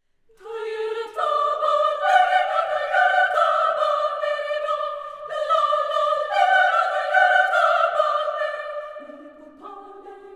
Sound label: Choir; Kodaly Centre 2015; Slovenian Philharmonic Choir